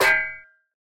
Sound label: shield